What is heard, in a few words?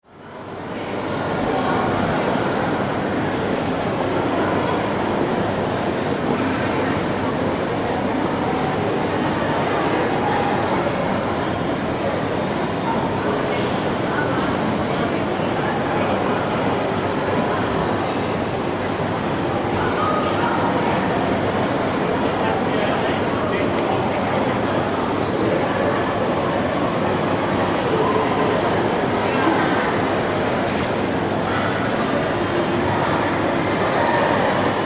Shopping Centre; Bentalls